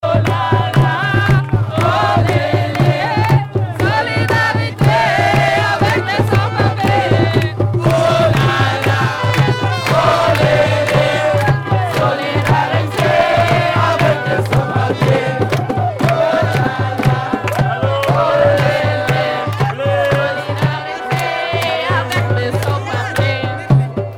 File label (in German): March for Freedom, Gesang Solidarite avec les sans papie; Schengen; Europadenkmal